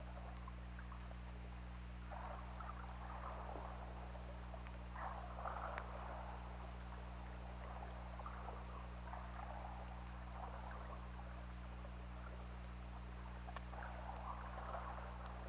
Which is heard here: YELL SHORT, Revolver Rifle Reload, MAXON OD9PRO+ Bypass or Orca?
Orca